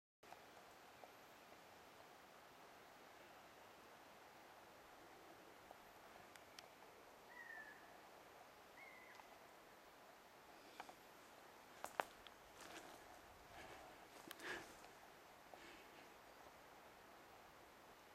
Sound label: honey buzzard anxiety whimper; hexhamshire